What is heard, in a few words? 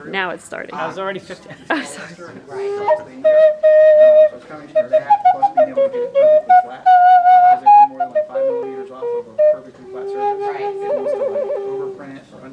flute; big story fest